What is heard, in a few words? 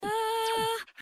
uhh